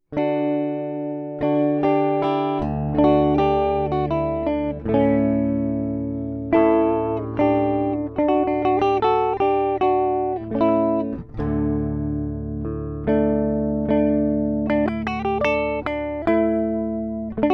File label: Fuzz